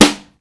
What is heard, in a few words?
BRUSH SNARE, HR-16; Hollywood Edge; The Big Bad Beatmachine